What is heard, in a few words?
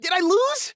death; Saitam